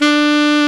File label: SAX A. MP D, Alto Short; Roland LCDP07 Super Sax